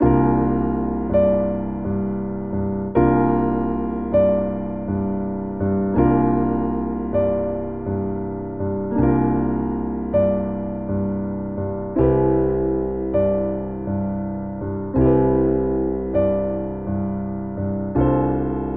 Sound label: Ostinato Relaxing Cmaj7 D C Bb C 80 bpm, Grand Piano; MIDI Clips; Ableton